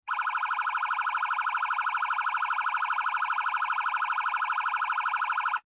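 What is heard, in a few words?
Piercer, emergency vehicle safety warning; 911 Signal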